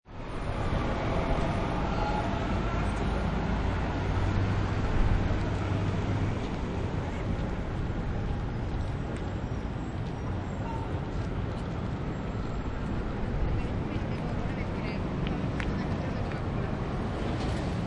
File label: New York City Traffic, construction site; Ground Zero - NYC, construction site at location of WTC tower 2, heavy building construction, constructing foundations